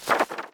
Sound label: Page Turn